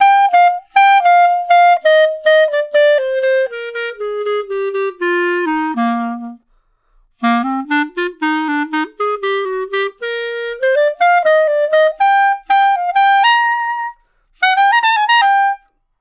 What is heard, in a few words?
Clarinet One Shot, melody